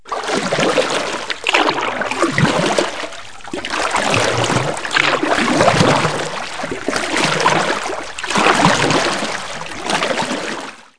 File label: movement; CD Action 28b; east front